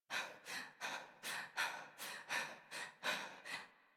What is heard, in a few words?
Breath Heavy Fast, Adult, en; Warframe; New War; Adult Operator